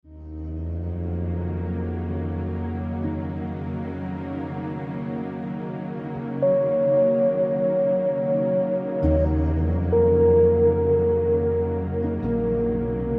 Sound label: Yoga